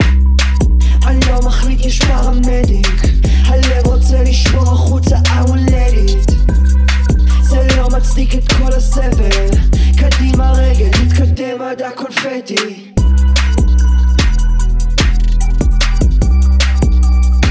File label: flagger vocals